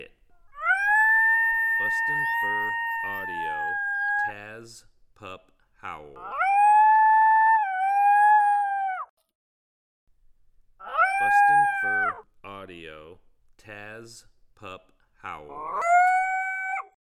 Pup Howl; Taz

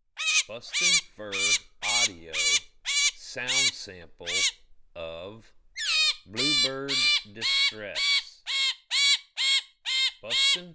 Blue Bird Distress

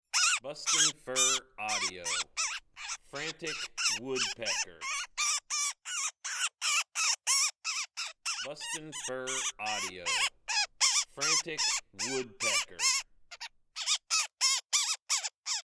Frantic Woodpecker

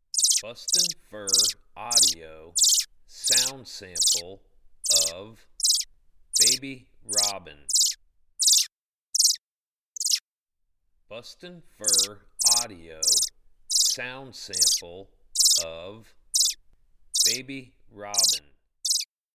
Baby Robin

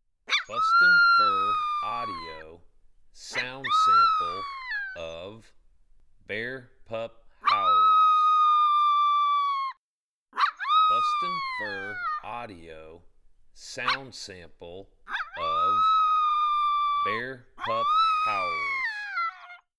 Bear Pup Howls

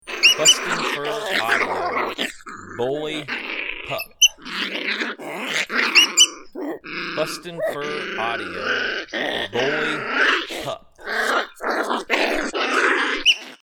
Bully Pup

